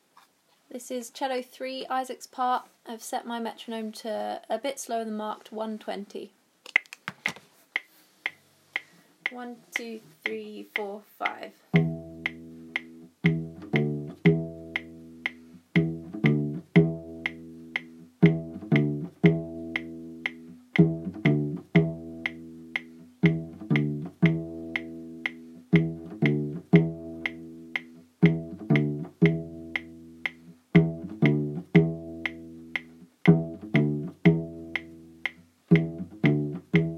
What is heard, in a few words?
Cello 3 part